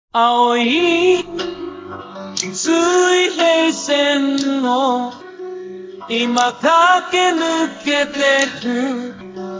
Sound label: vocal